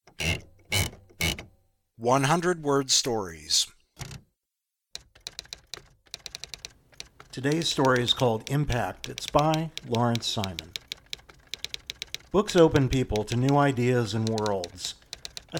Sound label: impact